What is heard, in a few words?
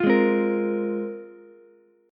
Ab 6th Add 9th Ab Ukulele C6; strummed; JGuitar